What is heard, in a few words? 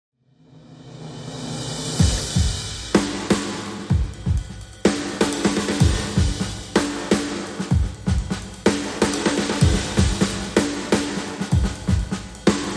Drums Only